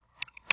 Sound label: Camera Video Button Press